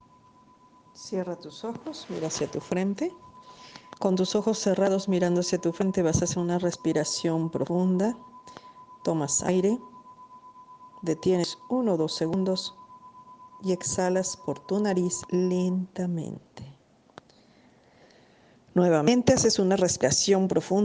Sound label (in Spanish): 8 hz cerebrales